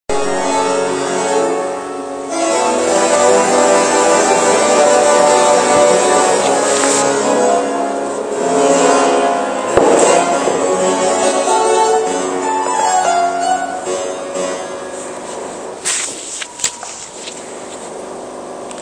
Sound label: harpsichord